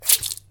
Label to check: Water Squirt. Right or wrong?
Right